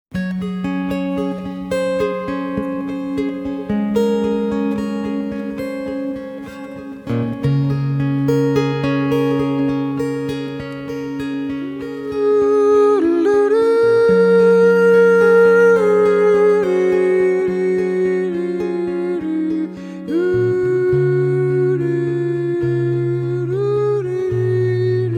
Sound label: solo